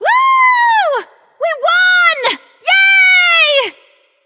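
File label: nonverbal yay; smite; voicepack; izanami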